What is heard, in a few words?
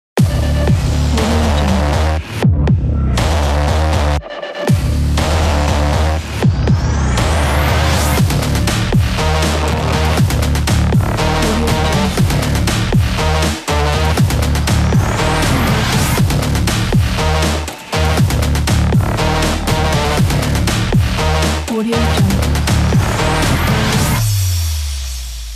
dark cinematic cyberpunk trailer logo